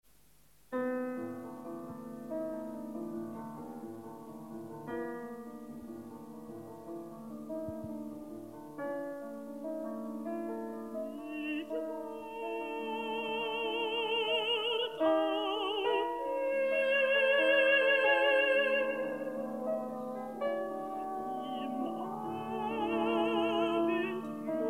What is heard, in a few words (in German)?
Mezzosopran, Horn und Klavier; Fuenf Lieder fuer Mezzosopran, Horn und Klavier, Nr. 3 Abendwind (Anfang)